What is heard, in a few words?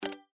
Spin Stop, SLOTS; Chinese New Year Deluxe